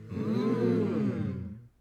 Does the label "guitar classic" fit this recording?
No